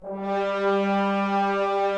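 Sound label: French horns, Brass, Woodwind Brass Ensemble; Miroslav Vitous - Woodwind Brass Ensemble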